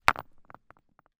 stone large drop down roll rocky ground; foley; zapsplat

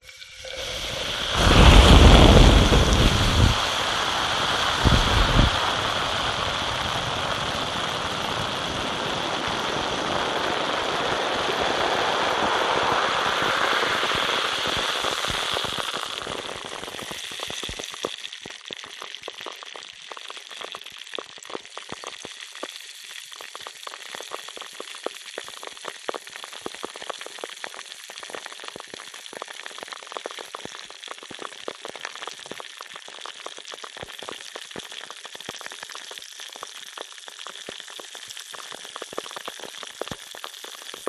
hydrophone bubbles pouring coke into plastic jar

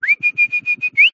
human whistle mouth short call animal; zapsplat

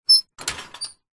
industrial cart metal pull short screech

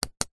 technology computer mouse click apple

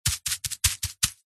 foley grass ground hand pats fast hits thuds

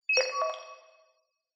science fiction cyberpunk robot cute beep; zapsplat

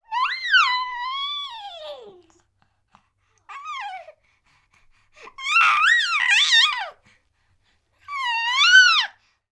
human baby 3 months happy vocal

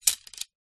tent pole movement; foley

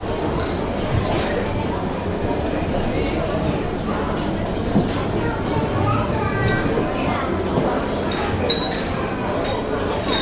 public places market farmers busy people germany; tom chapman

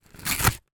foley card box small rip tear

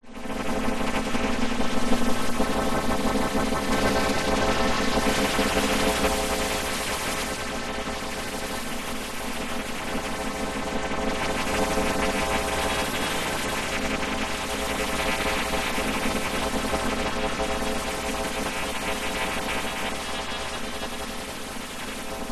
sound design digital glitchy drone pulsating long; scott lawlor

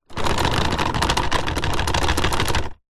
plastic hollow large shake rattle; foley

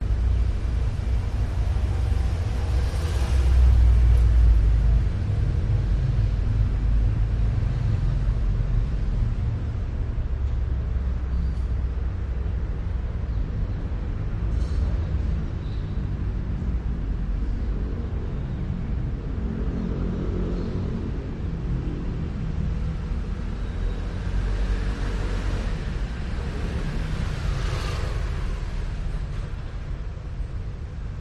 athens side streets weekday evening; tzireon; your free sounds

